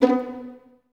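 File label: violins B3 pizzicato, String Ensembles; Miroslav Vitous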